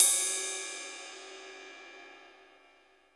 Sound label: DRY CY RD; Crypton; DrumBase5000